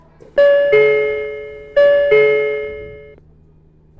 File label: metro06, door; tokyo metro